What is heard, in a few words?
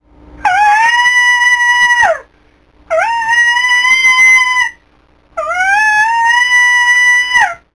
bleat